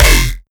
Kick

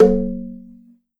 Perc Bata High Dry; Mrrentapercussionist

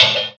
Perc Metallic Hit

